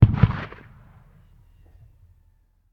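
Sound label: Cannon Black Powder; Sound Ideas; Guns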